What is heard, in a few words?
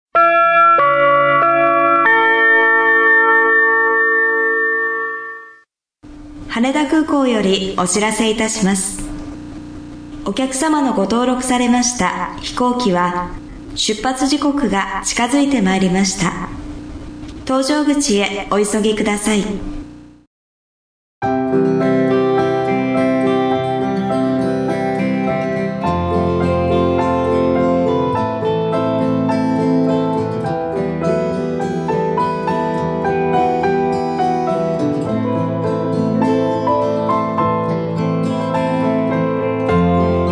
Off Vocal